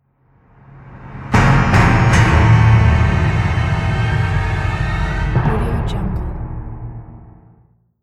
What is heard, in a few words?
dum dum duuuum orchestra cliffhanger